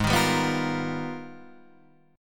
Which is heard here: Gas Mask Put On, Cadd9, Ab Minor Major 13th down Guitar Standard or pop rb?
Ab Minor Major 13th down Guitar Standard